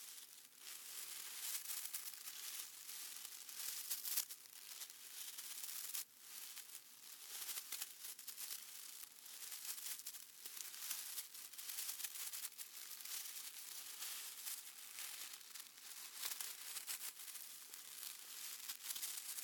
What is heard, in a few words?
RUSTLE Studio performed synthetic brush hairs shuffle LOOP; Rustle Tones